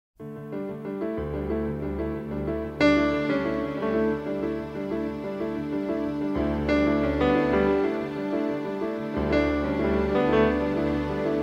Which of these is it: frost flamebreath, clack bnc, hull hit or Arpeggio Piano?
Arpeggio Piano